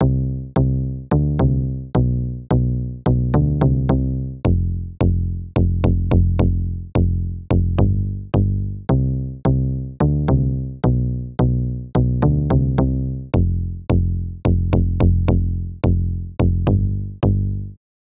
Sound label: bass guitar